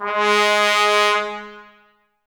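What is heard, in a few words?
trumpets G#, brass; Miroslav Vitous; Woodwind Brass Ensemble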